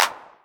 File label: 909 Clap, Novation Drumstation; Novation Drumstation V1